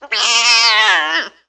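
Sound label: Cat Domestic Meow Angry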